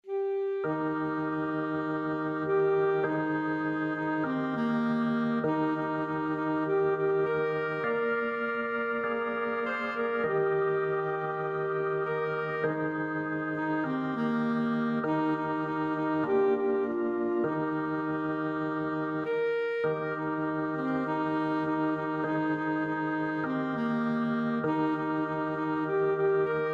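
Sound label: swing low alto saxophone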